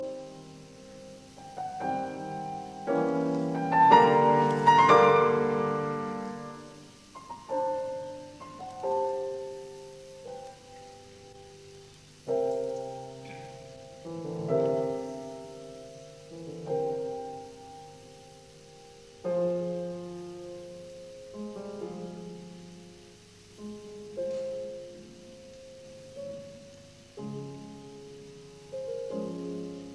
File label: Variations in C major on a Waltz; 33 Variations; Op 120; Variation 29; Adagio